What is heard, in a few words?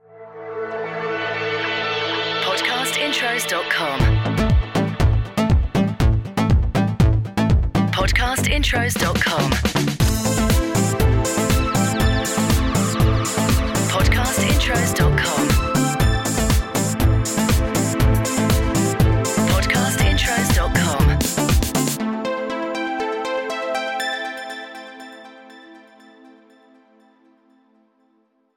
CORPORATE; Podcast Watermark